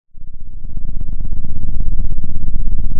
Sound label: pop, Sound of an engine revving